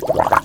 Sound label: Blub; Princess & Conquest v0.20.06